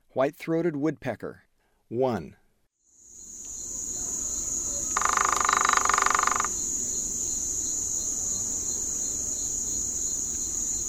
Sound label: White-throated Woodpecker; S. America; Eastern Lowlands